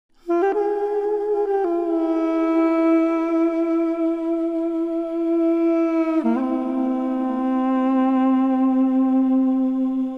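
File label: theme melody; Myst III Exile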